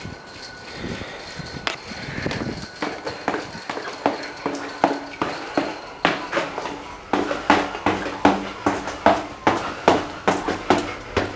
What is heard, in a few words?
ENV INT Bunker